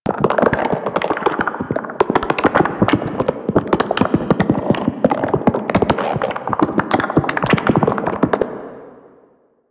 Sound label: exploding arm click loop; Warframe; Man In The Wall